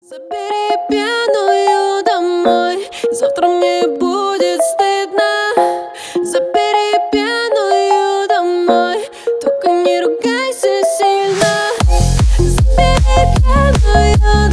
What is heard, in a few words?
remix